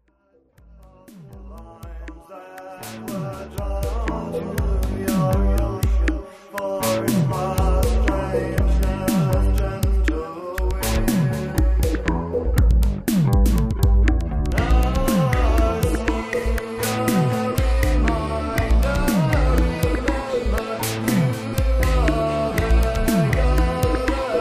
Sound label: Pop rock unmastered